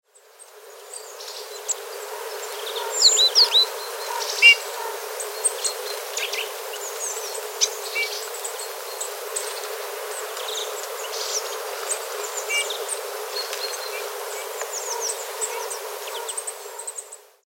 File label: pyrrhula russ; domherre